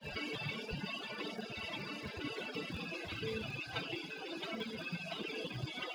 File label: Groove DUET